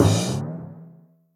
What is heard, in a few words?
siesta bad; Pajama Party